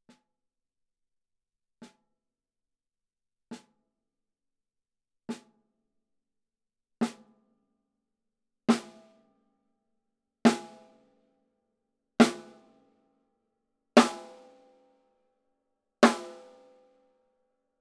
Rode NT5 overhead right high

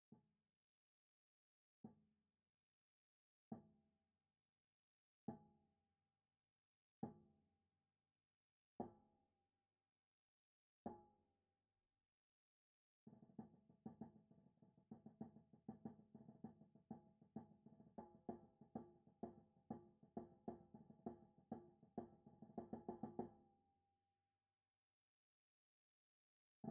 Bass drum SubKick high

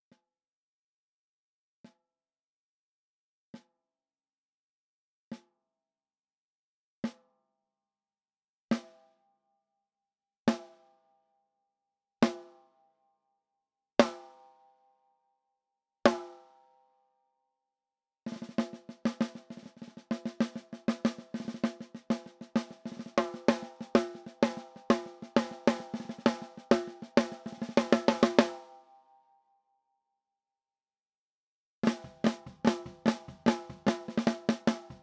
SM-58 close top high, 13x6 ahorn

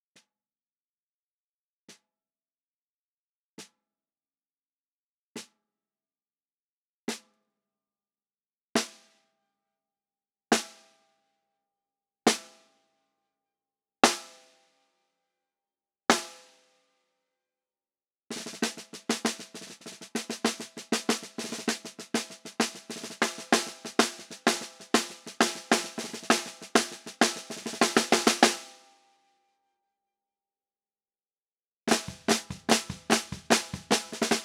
SM 58 close bottom high

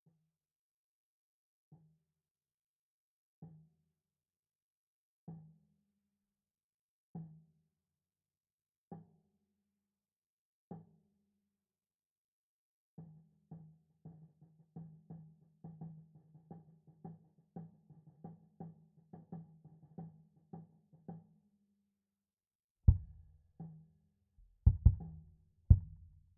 Bass drum Sub Kick low

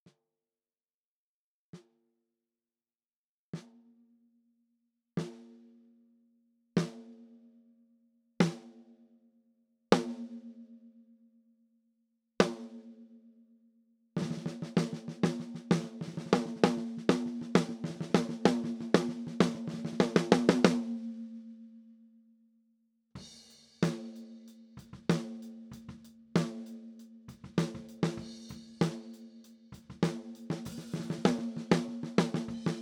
SM 58 close top medium

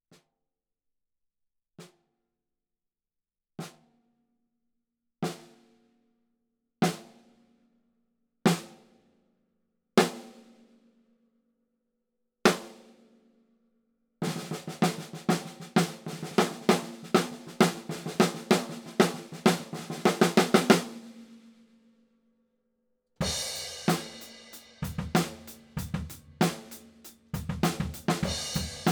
Rode NT5 overhead right medium

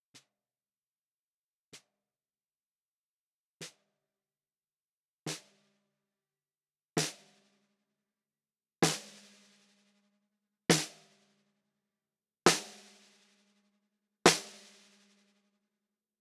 SM 58 close bottom low, 13x6" Ahorn; Low tuning

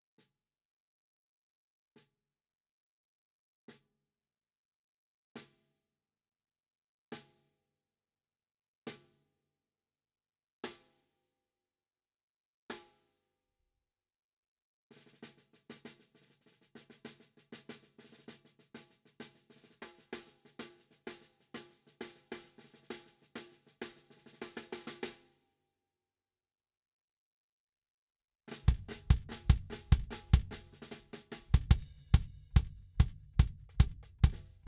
Bass Drum internal high

